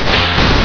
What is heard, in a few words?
pain; quake2; attic